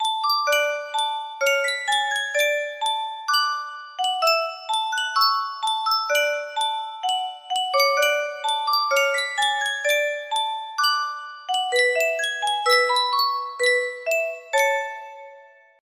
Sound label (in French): yunsheng boite a musique